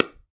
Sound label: PickelballPaddle Hit Ball Medium